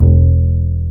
UPRIT BS E1, wood bass

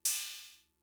Cymbal; Fricke MFB-522

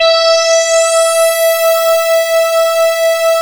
RESO E4; Best Service Alpha Dance vol.2

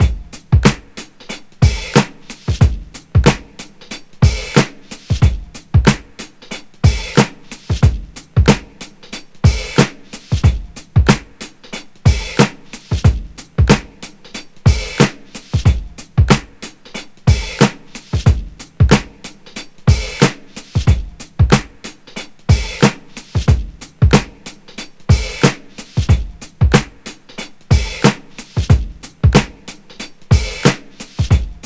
92 Hatchy Bounce, Breaks; Lockdown Breaks

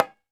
PRC TABLA

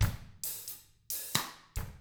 Double Platinum Drums, COUNTY

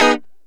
Lounge Guitar Chick 9ths C#3; Lounge Guitar Chicks

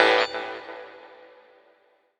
TING; Rawcutz; Nu Forms

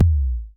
Kicks, Elektron MachineDrum; SPS1 MKII

